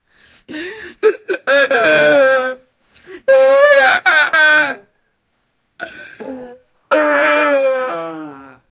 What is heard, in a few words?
man farting while crying, funny